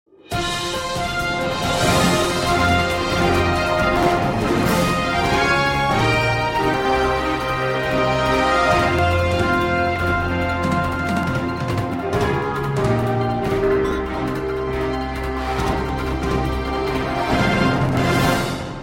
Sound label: Music